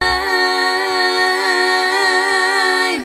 "eyen", Voice

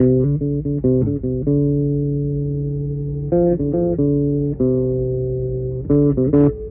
bass, Loops, Phonky

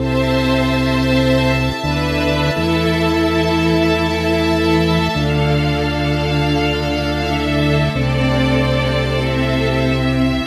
strings, Sad; Loops

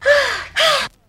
female orgasm

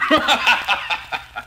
laugh

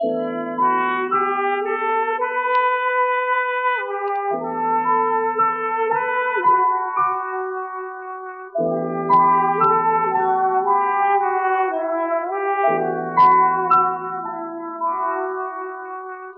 horns, Sad; Loops

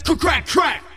cuh crack crack, Voice; ooh ahh

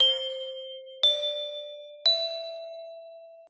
keys, phonky loops

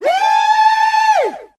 woo

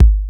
Kicks Soft

